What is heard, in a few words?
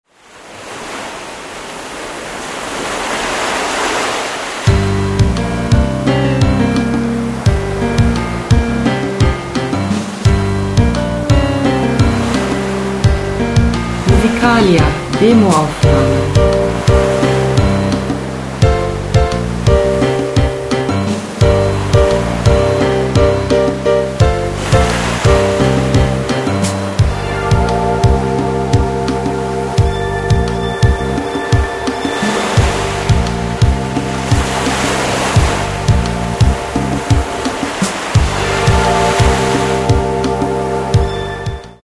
tropical waters